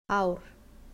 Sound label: camel